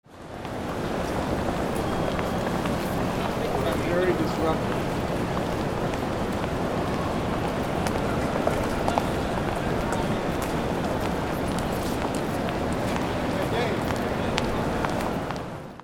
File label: AMBIENCE